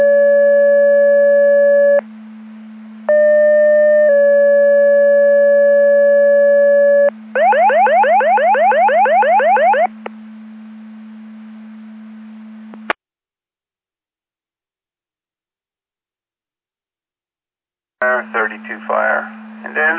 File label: Siren; Andover Twp Boro